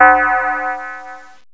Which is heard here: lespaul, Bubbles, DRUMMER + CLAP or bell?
bell